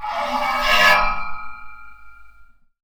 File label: Percussive Hit, contact mic